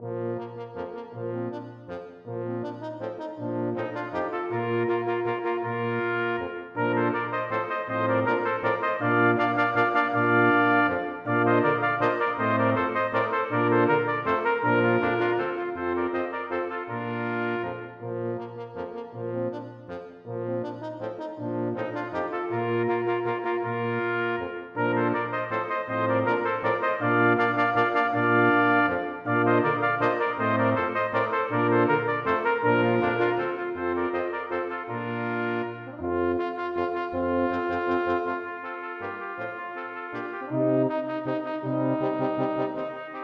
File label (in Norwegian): Menuet for messingkvintett